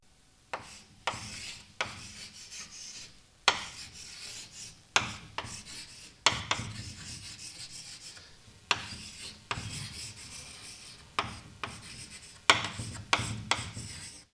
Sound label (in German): Kreide auf Tafel; geraeuschebingo